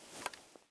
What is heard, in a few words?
End Reload, F2000